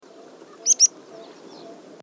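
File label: water pipit blakistoni, birds in flight; bayankhongorijn khukh nuur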